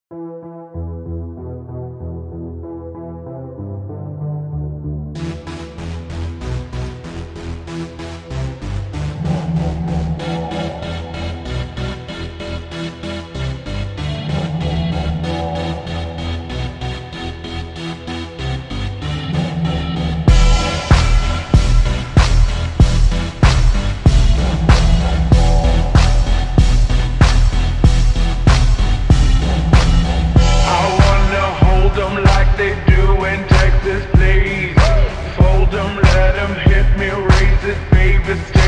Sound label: slowed reverb bass boosted